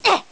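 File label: Roll, gameplay sounds; Link; zelda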